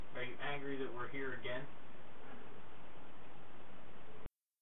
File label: UHH; pedricktown residence